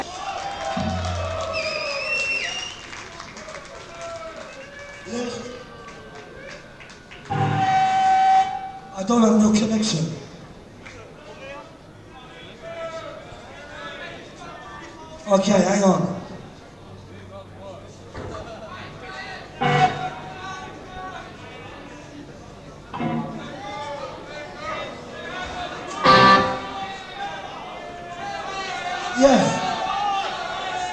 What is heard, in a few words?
Tuning; De Brakke Grond, Amsterdam